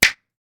Finger Click

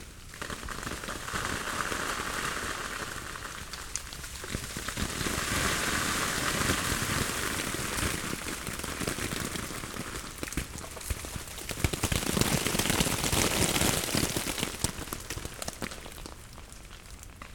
Falling Ice Short

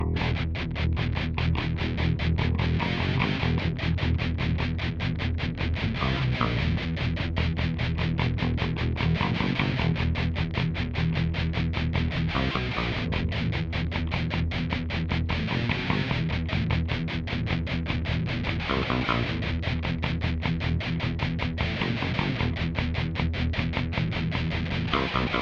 Guitar And Bass

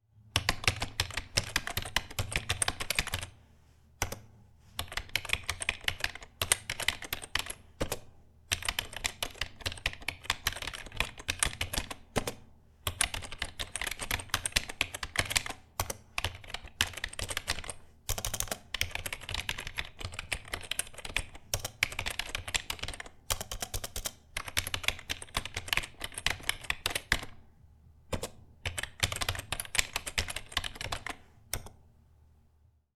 Typing On Microsoft Keyboard

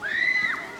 Scream

